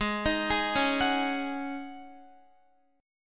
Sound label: Ab 7th Suspended 4th Ab down Guitar